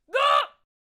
Peasant Attack Vocals

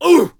Hwacha Attack Vocals

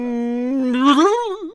Healer Attack Vocals

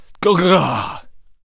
Gunslinger Death Vocals

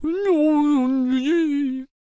Death Vocals

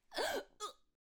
Cupid Death Vocals

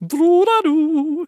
Present Elf Attack Vocals

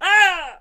Death Vocals; Musketeer

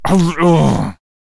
Miner Death Vocals

